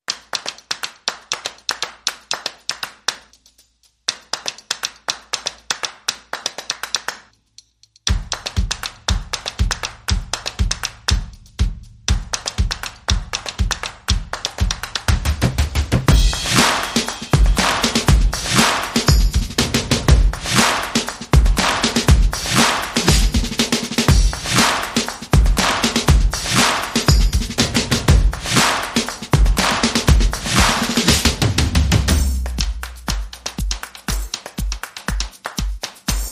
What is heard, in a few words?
Less Percussion